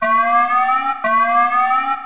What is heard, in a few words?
Alarm